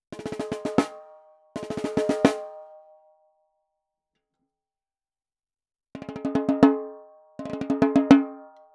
Snare record mit the t.bone SC140

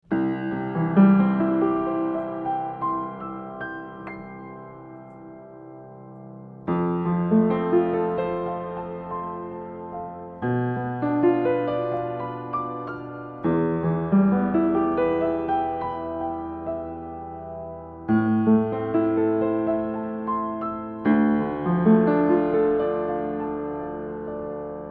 DPA 4060 Piano PZM Innen Deckel; LR Schoeps MK4

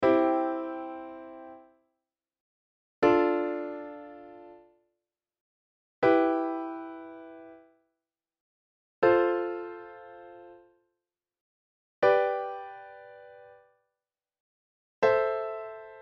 Alle Dreiklänge

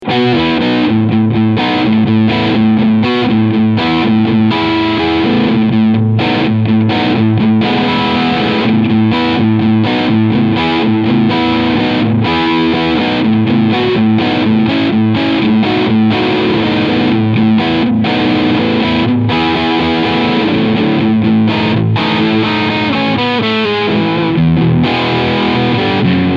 Skyhawk E-Gitarre Overdrive alle PUs

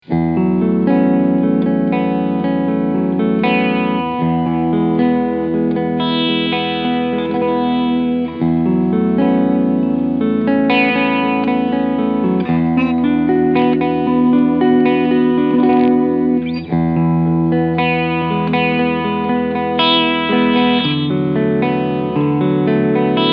Fusion II HH beide PUs Clean SC Modus

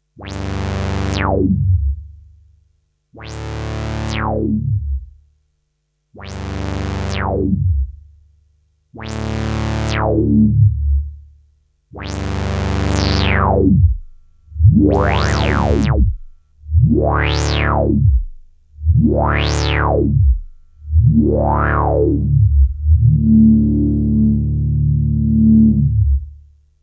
Saw mit Filtersweeps

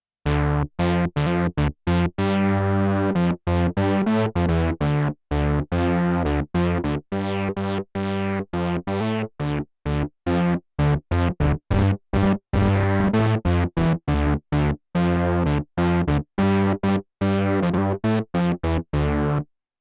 Moog Bass mit Feedback

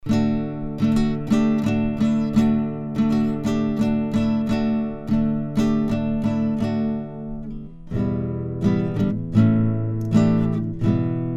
rode nt1 akustik gitarre

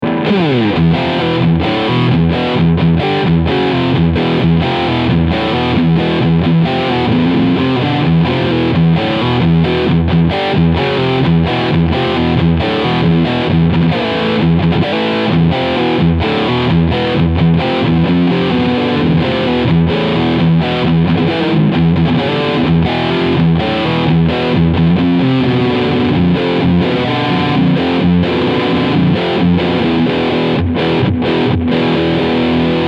PRS CE 24 Riff Sound Steg Pickup; Dustie Waring

